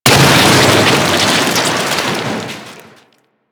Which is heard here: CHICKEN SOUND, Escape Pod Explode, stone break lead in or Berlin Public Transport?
Escape Pod Explode